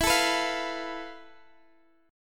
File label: F Major 7th Flat 5th E, guitar chord